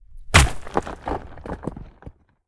wall Unhinge; Apotheorasis

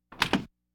Laugh tracks

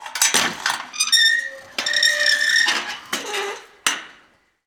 Gate Metal Fence Open; Doors

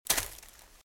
Footsteps - Leaves - Walk - Right; RadwinIsland

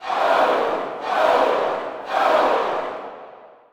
Boxing Crowd Chant; Crowds

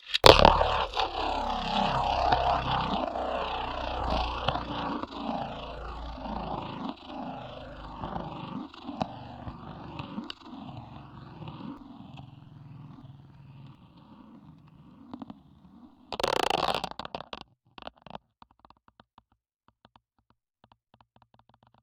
bayblade; NS Studios - ultra sound collection

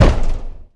Boxing Ring Bodyfall

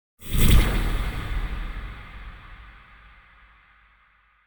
Impact Muted Hit Reverb; Hollywood Edge; sound designer's toolkit v2

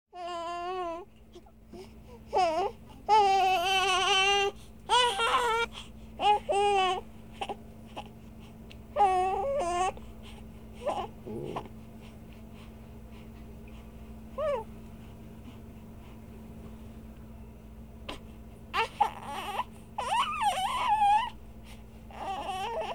Baby Laughing; KMP Film Video Sound Effects

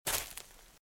Footsteps Leaves Walk Left; RadwinIsland

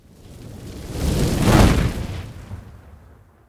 Fireball, Slow Whoosh Pass By; gun and weapon sounds; captain audio; fully loaded

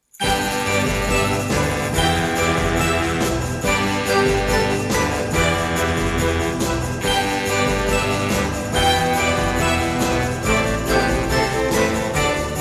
Christmas Jingles; AV Music Productions

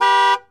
Truck Ford250 Horn; Trucks

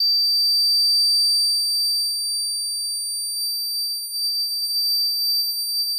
feedback; Blind Drive

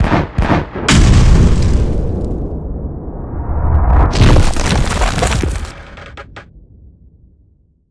Cannons

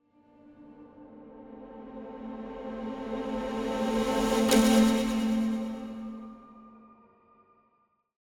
Symphonic Hit Reversed And Pulsating Pizzicato; Hollywood Edge; sound designer's toolkit v2

